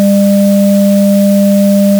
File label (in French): son bruité